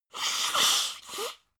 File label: nose blow; soundpool